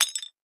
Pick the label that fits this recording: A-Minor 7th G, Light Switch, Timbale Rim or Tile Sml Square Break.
Tile Sml Square Break